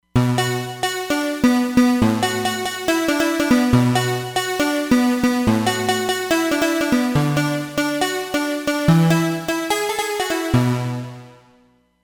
Oct Unison, Roland Fantom XR

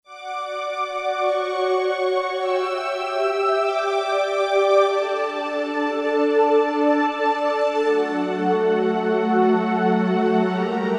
Strings Stack; Roland SC-880; Factory Patches

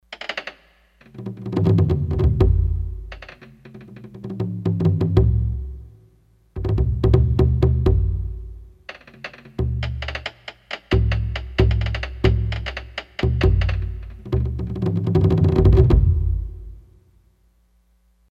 Wadaiko, Roland D-10; Factory Patches

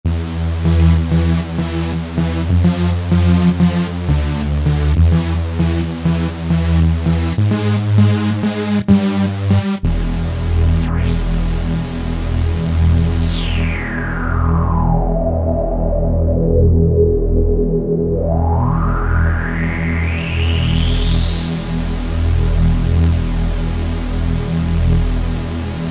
Scary, Yamaha DJX Voices

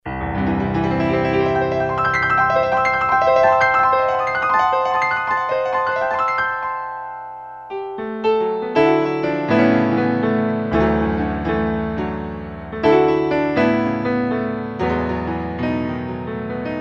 Stereo Grand, Kurzweil K2000; Kurzweil K2000 V3

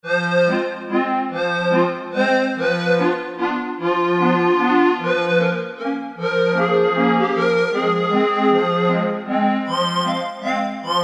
Sqrpad, Yamaha TG77; Factory Presets

